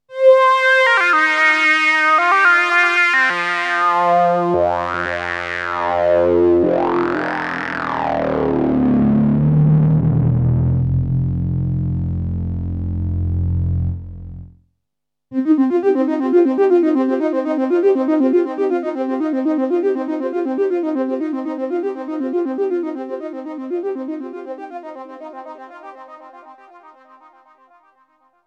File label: DSI Pro 2 Waah mix